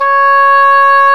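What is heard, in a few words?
English horn; Sound & Vision - Gigapack I CD 2 (Roland); Brass&Woods1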